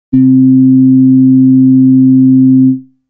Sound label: Solar-Flute A